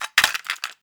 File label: Cassette Rattle